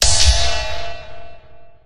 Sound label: Locked gem appears